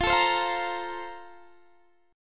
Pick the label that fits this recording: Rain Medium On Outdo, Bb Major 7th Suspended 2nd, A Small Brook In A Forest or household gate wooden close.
Bb Major 7th Suspended 2nd